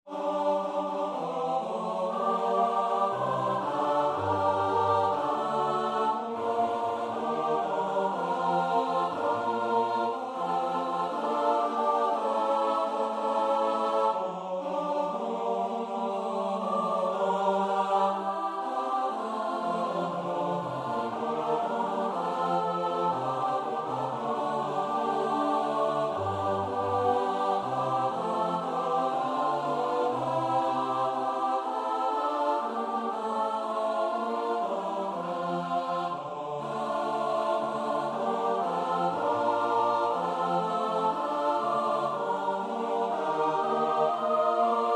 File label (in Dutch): S-A-T-B, koorpartij oefening; Schütz (Heinrich); Matthäus Passion SWV 479